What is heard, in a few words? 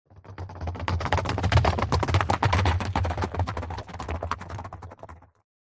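By 2 Horses Canter Past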